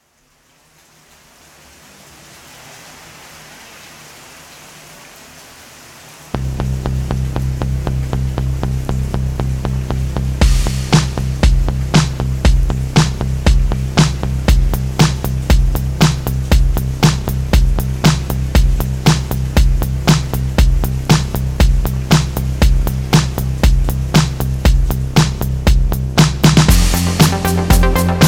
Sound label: Soft Rain